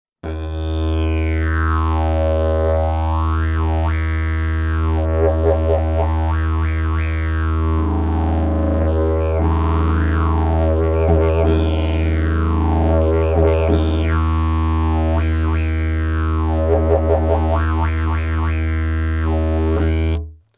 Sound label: didgeridoo; jilm prirodni